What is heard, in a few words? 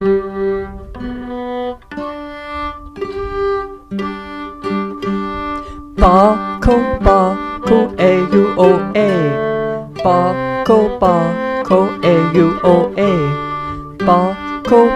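Tenor Words; Bacco Bacco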